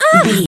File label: voice female hurt pain mild